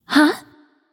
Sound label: confused light; smite; voicepack; hel